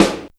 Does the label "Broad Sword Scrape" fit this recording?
No